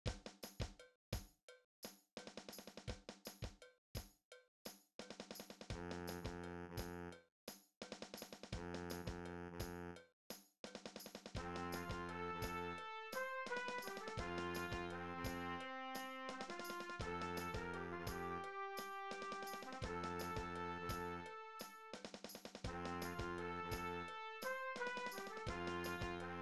lavere tensax, Bruremarsj

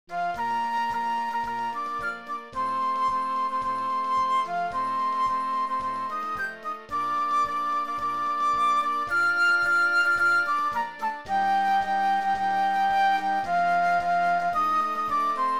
flute

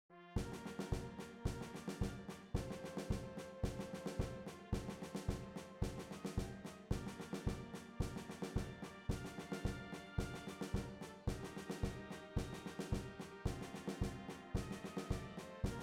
perc

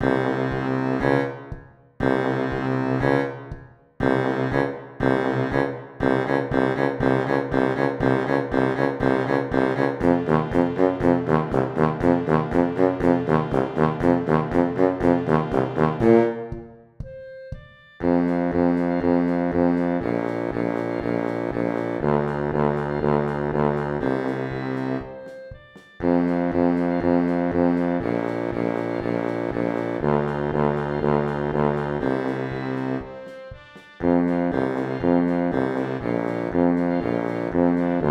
A tuba; Borgertog2019

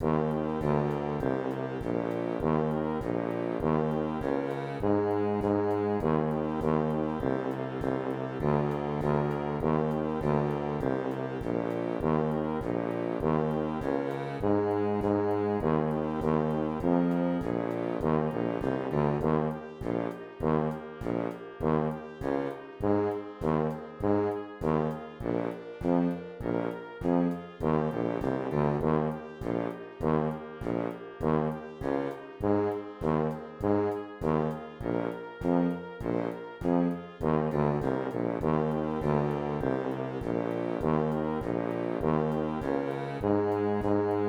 tuba; Jul

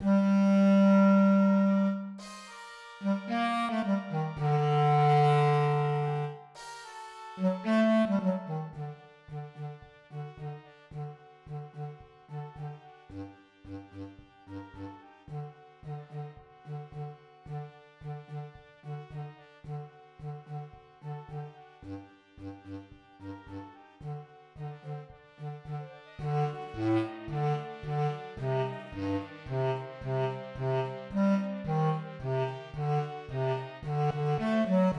Bb Tuba; HavahNagilah

